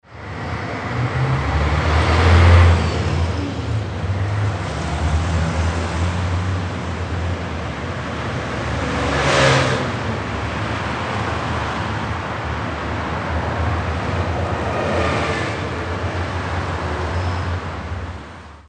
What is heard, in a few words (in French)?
fenetre ouverte